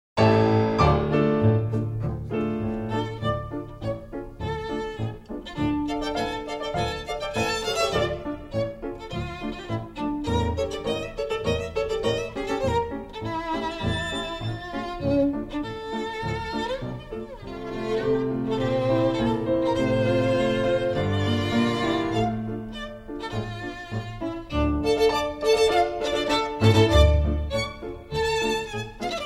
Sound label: Miniature Viennese Waltz